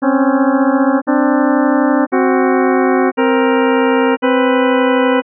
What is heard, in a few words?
C4-C4 C5-C5 Dissonant